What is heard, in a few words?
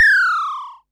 Perc Synth Raw